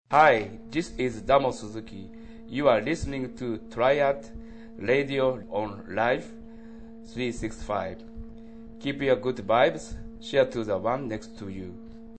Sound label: jingle